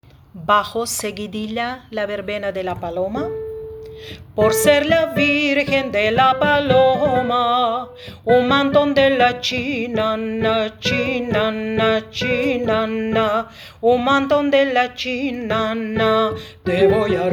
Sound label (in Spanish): bajos verbena